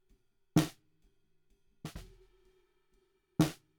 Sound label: SNR Bot